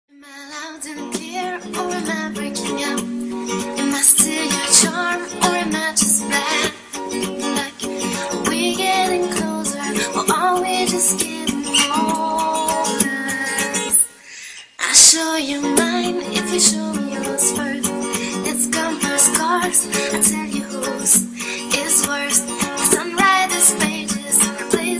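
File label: acoustic cover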